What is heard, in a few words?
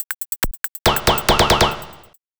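DRUMLOOP ELECTRO 140; Techno2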